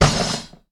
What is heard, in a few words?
IMPACT Metal Medium Box Rattle; mono; IMPACTS; Universal Sound FX